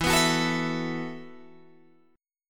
E Suspended 2nd Suspended 4th E, down, Guitar, Open G